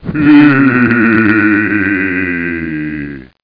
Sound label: hehe; scare